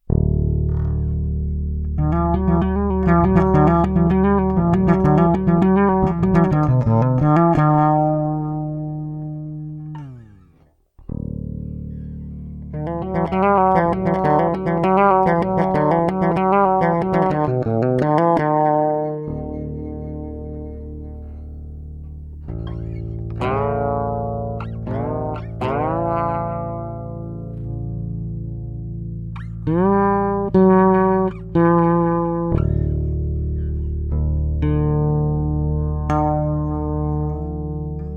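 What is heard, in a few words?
Flat Wound diverse EQs, bass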